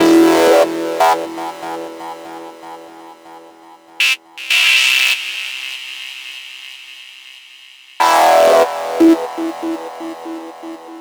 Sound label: NRG Lead, trance